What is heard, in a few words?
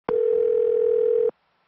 ringing